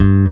E bass (G) brighter; Sonic Foundry (Sony Creative Software); Ugly Remnants VOL-2; Basstuff

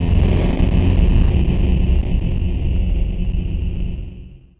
orc f0, FAIRLIGHT Orchestra

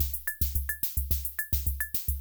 Kay R-12 Rhythmer Loop, Drum Machines

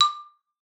D5, xylophone; Xsample VOL-4

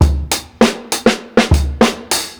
60'S STYLE 100 BEAT, DRUMS LOOPS; BASEMENT DRUMS VOL 2

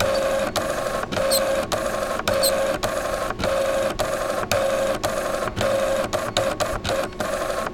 printer; AKAI S6000 CD-ROM - Volume 6; Tools

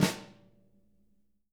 SN, snares; Sampleheads; Peter Erskine Living Drums

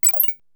GUIRO; DRUMS & PERC

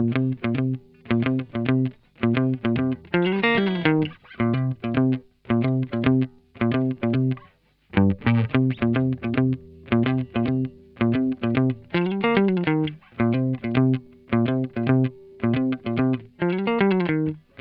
109 C FUNK GTR; 109 BPM

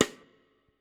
Kit, breakcore Drum Hits

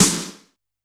G1 - SD Rock H, Yamaha PSR-E323 Rock Kit

